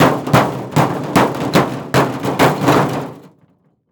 Crash Bricks Dropped Rapid Fire On Car; Crashes

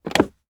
Transportation Jeep Commander Open Console

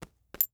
Impact Coins Multiple Coin Drop On Felt

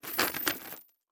Coins Jingle In Envelope Short; Foley

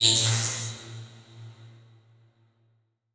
Title Transition Electrical Zap; Adobe; Production Element

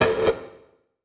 Transition Hit Impact Electrical

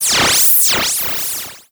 Multimedia Internet CD-Rom Flash Radio Element

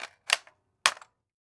Weapon Gun Rifle M16 Clip Insertion; AdobeCC

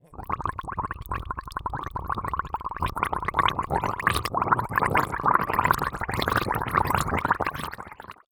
Human Male Gargles Bubbles

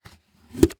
Container Open Lid Plastic; household

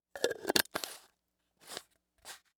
Household Jar Close Screw Top

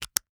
Technology Cell Phone Battery Inserting

